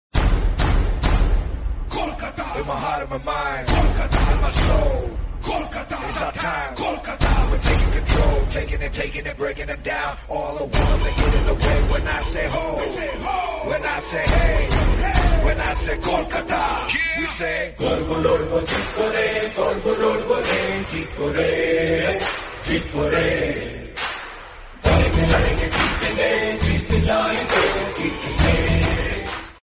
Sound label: Theme Song Ipl Ringtone; KKR; Ipl 2021